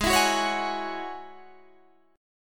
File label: G sharp 7th Suspended 4th Sharp 5th G sharp Guitar Standard; 16,19,16,18,19,16; G#7sus4#5 strummed; capo=2